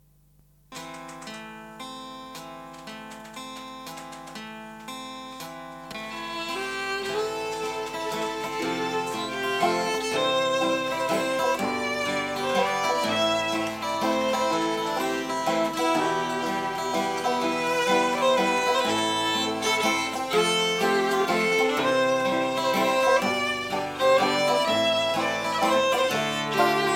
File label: Waltz, Bluegrass